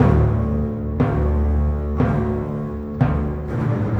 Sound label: Orchestra, Rock Pop; Sonic Foundry (Sony Creative Software); Orchestral Series VOL-4 - Rock and Pop